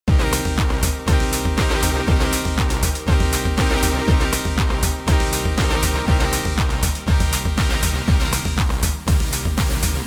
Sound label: Chord Stab Bass TR Drum no FX